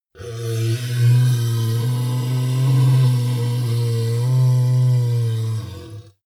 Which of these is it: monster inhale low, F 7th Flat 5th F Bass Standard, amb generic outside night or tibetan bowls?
monster inhale low